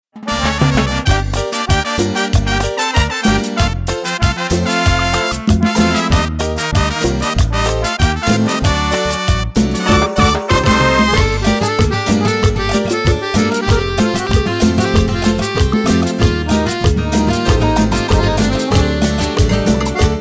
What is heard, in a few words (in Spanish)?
Version Cumbia